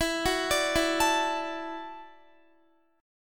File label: E 7th Suspended 2nd Suspended 4th E down Guitar 4E 4A 5D 5G 5C 6F; arpeggiated